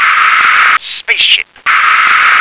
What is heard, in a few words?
spaceship